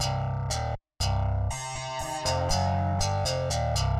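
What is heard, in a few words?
Pop Rock Guitar Sound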